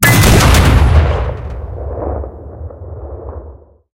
cannon 122mm shot, cannons tanks

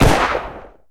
explosion smoke; cannons; War Thunder

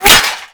impact metal; Modern Warfare

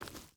footstep hide forest walk; Rust

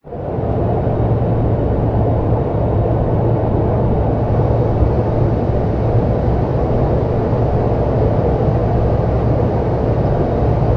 ship engines; World Of Warships

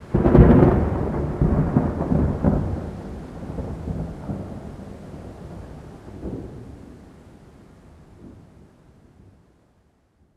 thunder med; Rust

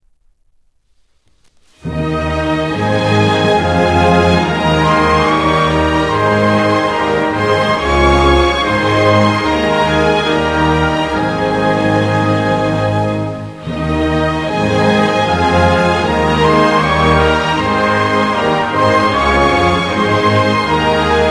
Music; Netherlands; DCS

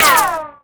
rico dirt, ricochet; Modern Warfare

